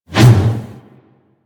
Mace Whoosh Slow; Warframe

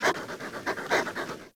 idle pant fast, Kubrow; Enemies; Warframe; Tame

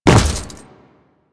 Step Default, Footsteps; Warframe; Grineer

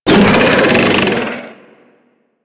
Thumper Pain Groan B; Warframe; Grineer Thumper Bot

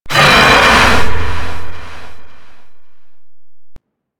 Golem Growl; Warframe

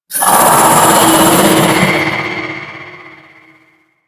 Vocal Alerted; Enemies; Vocals; Warframe; The New War; Archon; Nira

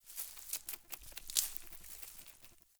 Hare Idle Chatter; Encounters; Animal Rescue

